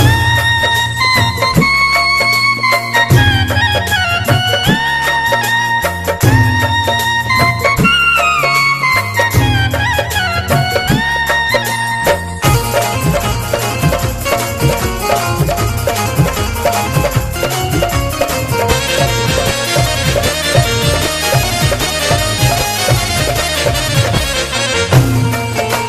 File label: Music; Krishna Ji